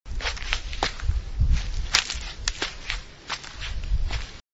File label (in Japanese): kusa